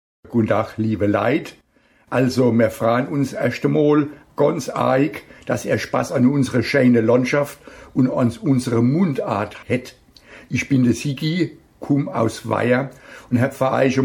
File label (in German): Mundartlied